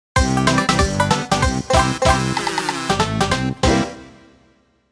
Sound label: Banjo Kazooie; Jiggy